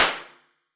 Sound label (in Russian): mono impulse dlya vannoj zadnyaya storona diafragmi mikrofona